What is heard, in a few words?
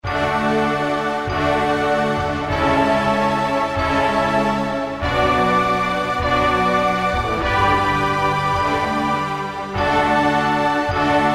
Kurzweil K2000; Samples; Kurzweil K2000 Value Pack; It's a Hit